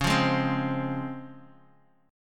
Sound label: Db Major 7th, Guitar DADGAD